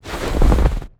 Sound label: snow footsteps walk deep; Bluezone